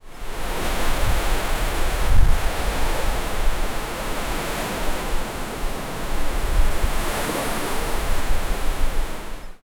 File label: calamity